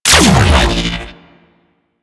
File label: Laser Spin Ability; Warframe; Corpus; Ambulas